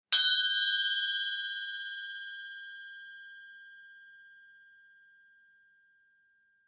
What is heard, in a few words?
bell ring